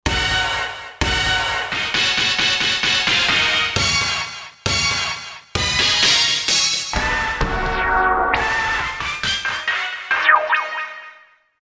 Lo-Fi Hits, Roland SR-JV80-12 Hip Hop Collection

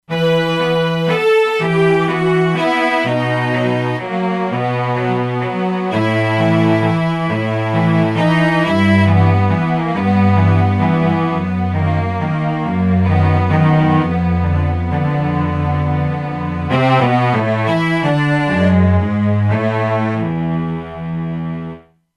Arco Celli, E-mu Proteus-2; Factory Presets

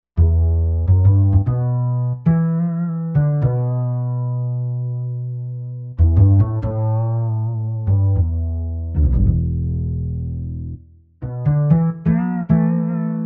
XFade Bass

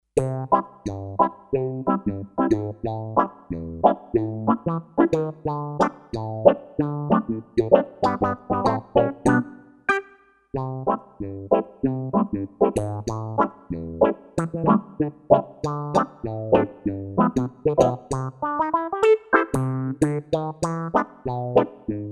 Quack, Kawai K5000S

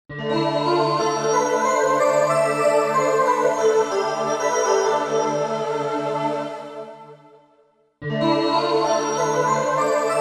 Voices Bell; Korg MSC-1S Variety

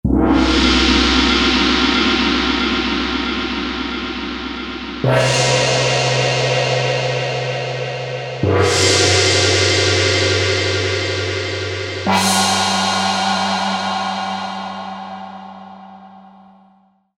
Gong, synth

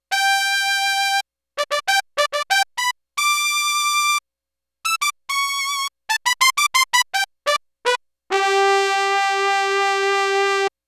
Trumpet

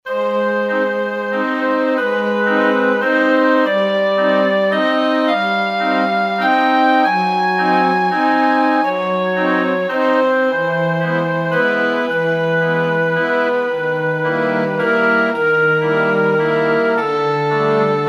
Oboe & Flute w Str, Kurzweil K2000 Orchestral; Kurzweil K2000 Orchestral ROM

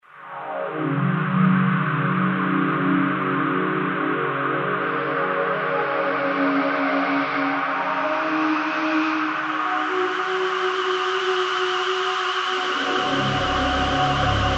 Snare Pad, Kurzweil K2000; Kurzweil K2000 Value Pack; Extra Programs

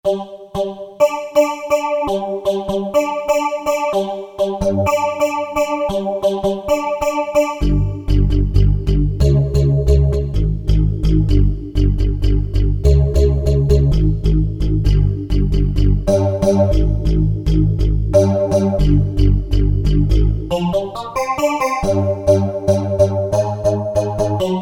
VOIBASS, Metal, Korg DSS-1; Metal-Metalism

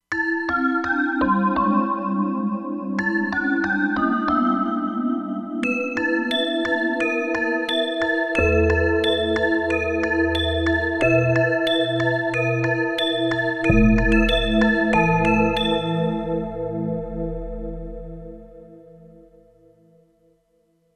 Temple Bell, E-mu Proteus-2; Factory Presets

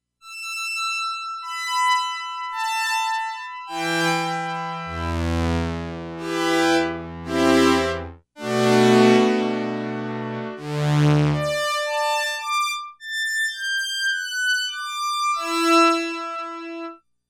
Solo Strings

